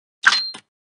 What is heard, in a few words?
coin; cuphead